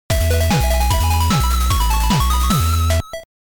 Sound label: Level Clear; NES